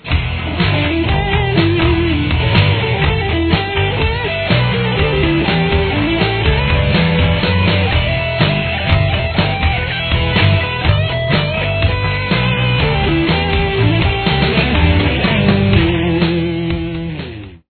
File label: pentatonic minor comparison, guitar